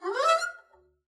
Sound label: arco noise high medium, double bass; dsmolken; noises